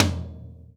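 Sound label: floor tom 1 rimshot, drum kit; 90s sample CD; drum kits small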